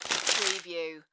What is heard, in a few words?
Paper Crumple Short